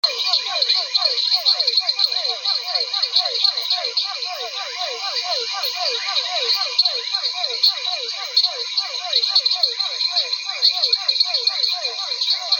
Physalaemus cuvieri

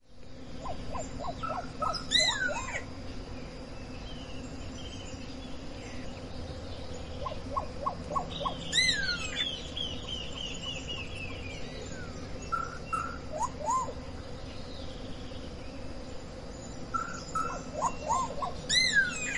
Cacicus solitarius, Boyero Negro

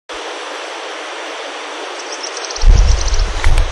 Asthenes pyrrholeuca; Rio Alumine

